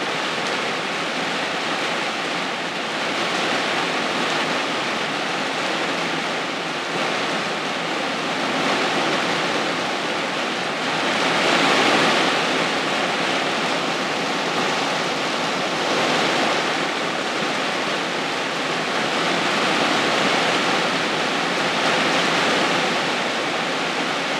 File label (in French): La pluie sur le mobile home